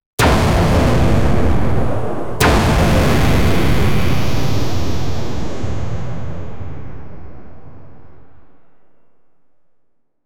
futuristic beam laser

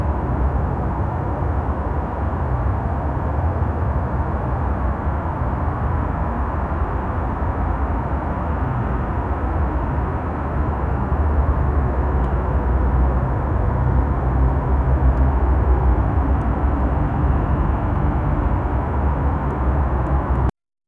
distant cityscape, city noise

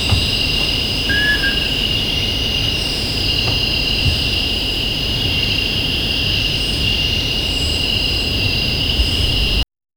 early morning forest soun

